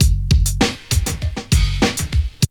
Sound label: BREAK